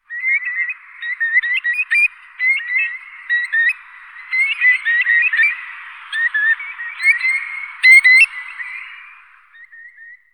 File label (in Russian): kronshnep bolshoi numenius arquata